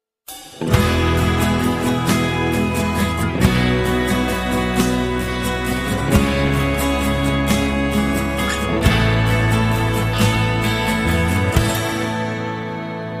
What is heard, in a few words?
instrumental